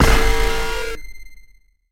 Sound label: Hit Glitch